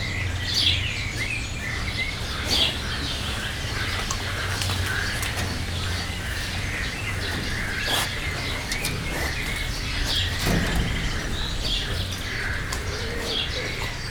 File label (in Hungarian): valami t rág, csalnak, mikrofon megszimatolása, fehérkezű gibbon; jaszberenyi zoo 2015